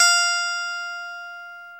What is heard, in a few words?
F4 Strat, clean; InVision Interactive; Lightware VOL-1; Instruments & Percussions